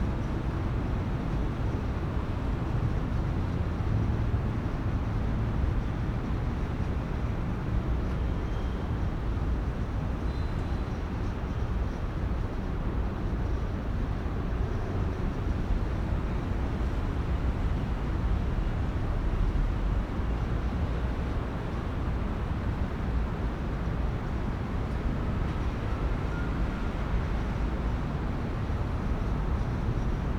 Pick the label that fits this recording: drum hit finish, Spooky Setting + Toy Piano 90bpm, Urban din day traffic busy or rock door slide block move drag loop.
Urban din day traffic busy